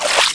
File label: galapago attack; classicro